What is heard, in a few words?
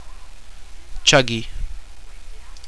kick